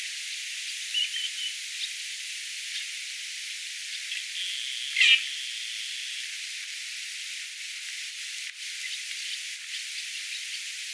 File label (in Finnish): rantakurvin aantelya aanitetty pitkan rannan lintutornista; rantakurvin ääntä pitemmästi